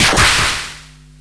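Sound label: Battle Damage; Etrian Odyssey III HD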